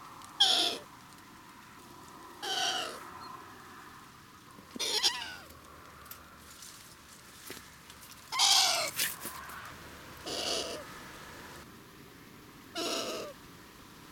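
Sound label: canto verso femmina gufo comune asio otus long eared owl waldohreule buho chico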